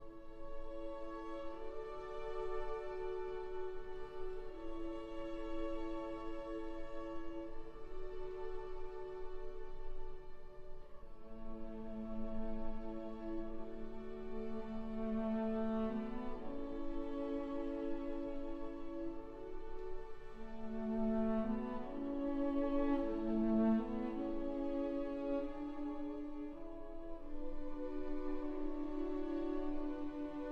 for cello and strings

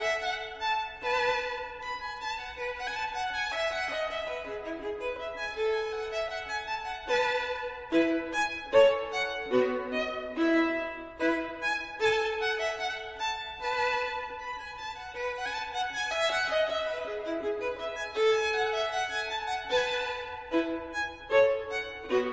Gavotte en rondeau, Violin

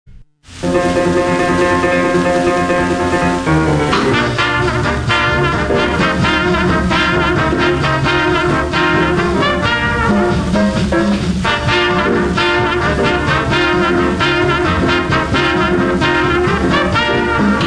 Trumpet Ensemble

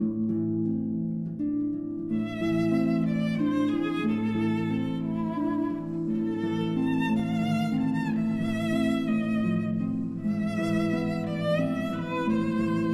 Berceuse, for violin and harp

